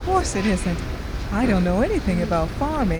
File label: noisy